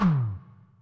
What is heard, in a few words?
scrub, Yamaha Tenori-On; Scrubkit